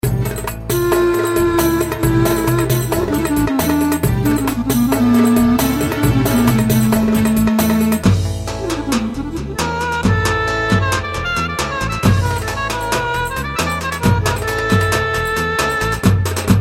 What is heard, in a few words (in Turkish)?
pa 55 roman, korg; 2011 set ankara oyun havalari